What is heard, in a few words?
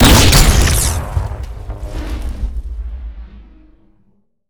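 emp explosion; gmod; viper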